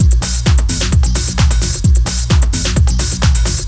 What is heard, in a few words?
drumloop; awe32